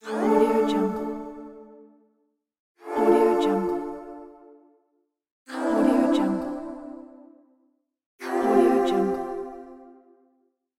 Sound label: godlike fantasy level up